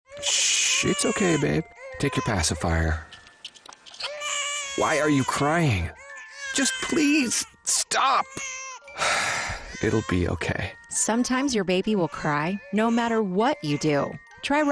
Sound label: Babies Cry